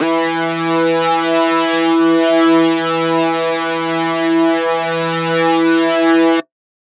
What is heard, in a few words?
Lead Shot E Sync, Dubstep; One Shots; Ghosthack; Dubstep Sound Arsenal